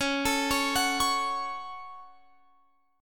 Db Suspended 4th Sharp 5th Db, Guitar, Open F; arpeggiated; x,20,21,20,21,20

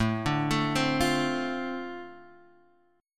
D-Minor 7th A, chord

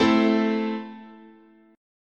A Minor, guitar chord

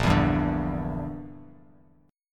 A sharp 6th Flat 5th, Bass Standard; A#6b5 strummed; JGuitar

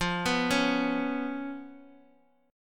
B Suspended 2nd Flat 5th F

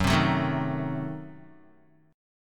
Gb Diminished 7th Guitar; strummed; JGuitar

